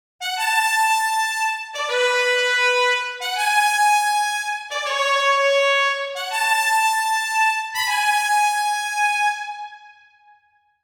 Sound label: Trumpets